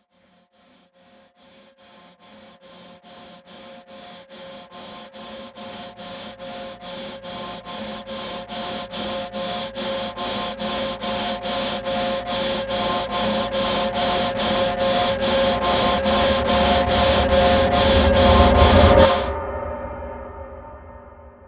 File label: Synthetic Breathing